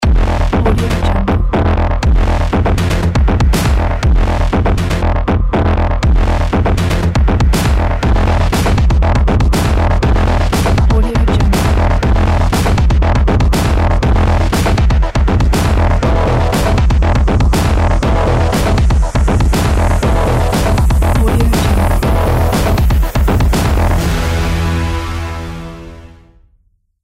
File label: warrior cinematic ident